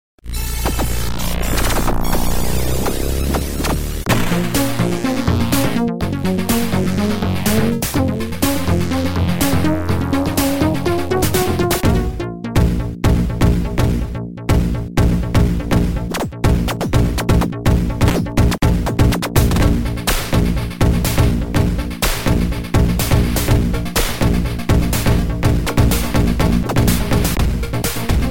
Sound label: Extreme Electronica